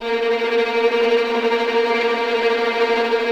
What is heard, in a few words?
VI TREM F; AKAI Advance Orchestra Upgrade 97 Vol.1